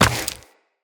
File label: Run Rock, Footsteps; Tenno